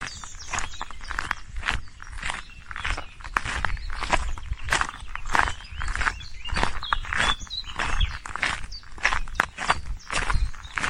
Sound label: walking in woods